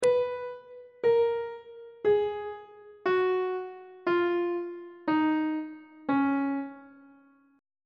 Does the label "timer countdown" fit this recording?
No